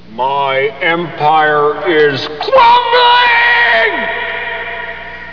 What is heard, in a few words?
crumbling